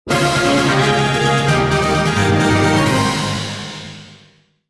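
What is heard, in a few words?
game clear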